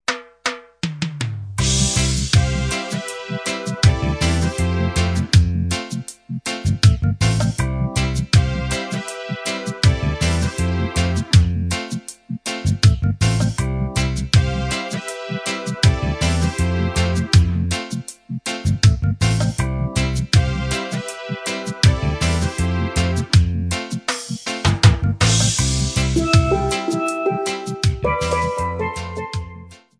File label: steel and voices